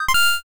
load

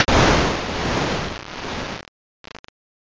thunder

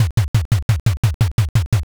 taiko roll

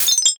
dish break

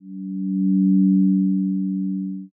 zero g

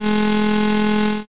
shredder

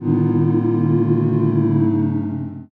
commotion

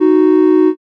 horn

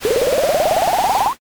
pour

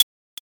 stopwatch

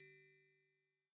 triangle

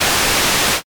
mosaic

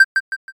cursor

